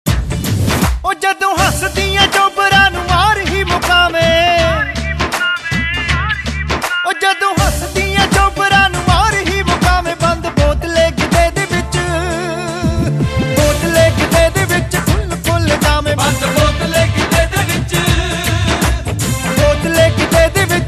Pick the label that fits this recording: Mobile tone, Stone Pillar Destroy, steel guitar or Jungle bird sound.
Mobile tone